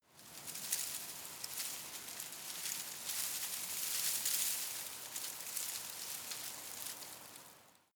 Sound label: shrub tiny leaves rustle very soft, garten; haus-garten